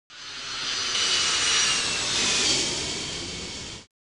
Airplane

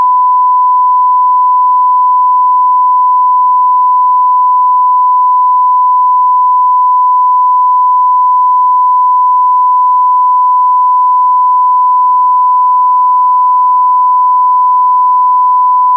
Calibration Tone 1000Hz 94dB

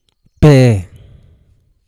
bee

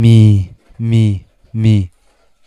fire

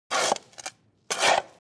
SHOVEL

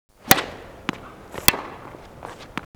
TENNIS

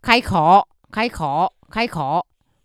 cough

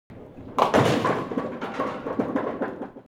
BOWLIN

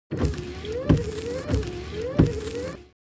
WIPERS

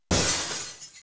GLASS BR

